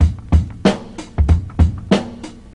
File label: 94 bpm drum beat d sharp key, Free breakbeat - kick tuned to the D# note.